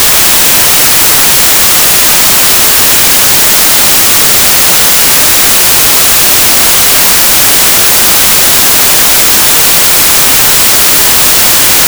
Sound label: 44100hz 16bit mono